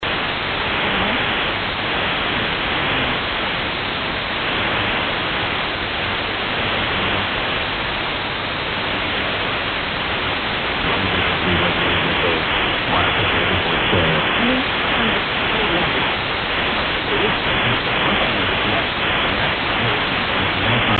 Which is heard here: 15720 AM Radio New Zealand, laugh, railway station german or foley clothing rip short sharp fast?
15720 AM Radio New Zealand